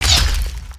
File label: Fish Crossbow Fire; weapons; aronwk collection; ndaudio